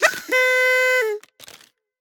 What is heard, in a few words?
win trumpet; Hot Party Deluxe; Wazdan